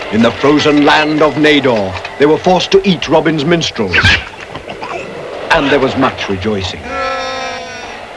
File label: Rejoicing